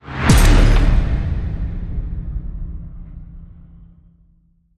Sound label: Hit Big Firebomb